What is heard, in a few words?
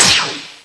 Zap; 3D Ultra Pinball Demo; Pinball Demo Music